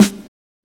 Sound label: Snare, Drum and Bass; EZ Rollers; Instrument Samples